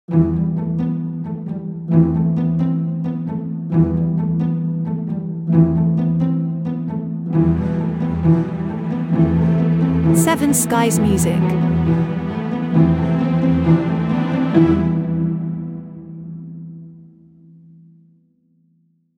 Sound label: Crescendo Stingout